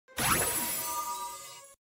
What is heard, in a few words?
magic sound effect